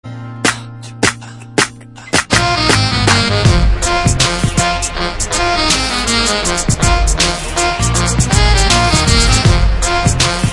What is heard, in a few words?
ring